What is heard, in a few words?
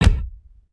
chest pound; creatures organic